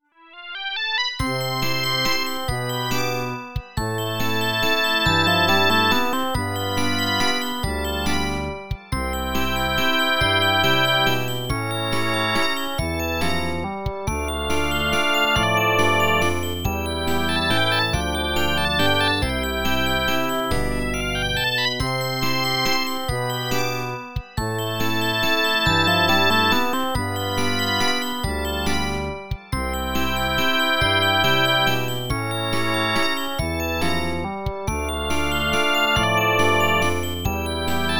Waltz; Burning Point; PC-88

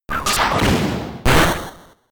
Vacuum Wave

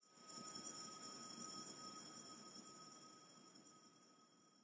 Amb Space race Crystals

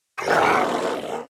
Warbeast Roar; Puzzle Chronicles; PS3; PSP; X360

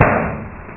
sound (pop)

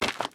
Cave Footstep; The Inn

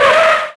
Skids Short

